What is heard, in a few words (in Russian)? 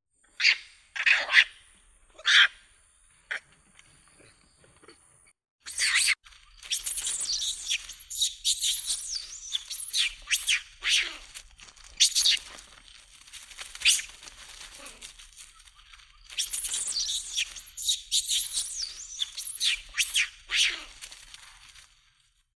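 macaque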